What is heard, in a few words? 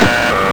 ar2 empty, weapons